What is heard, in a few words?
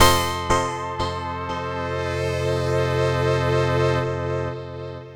Synth Pattern; Electrocution